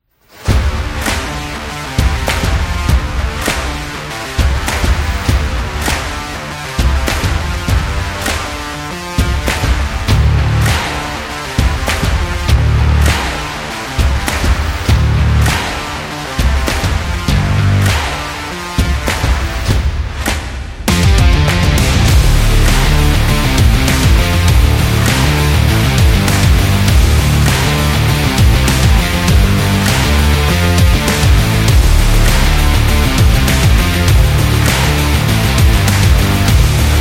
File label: Energetic Upbeat Indie Rock